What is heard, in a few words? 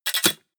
mechanical lever metal small up